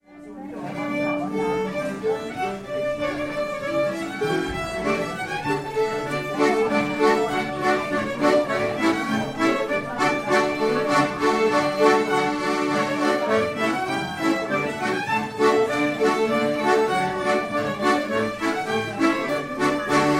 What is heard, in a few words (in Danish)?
ringridningspolka; fynboerne; almindelig laugsaften